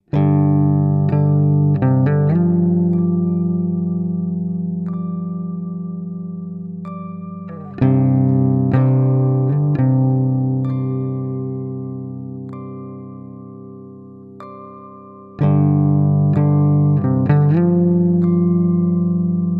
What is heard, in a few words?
Desert